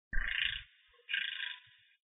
EASO warning

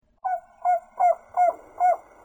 hoot

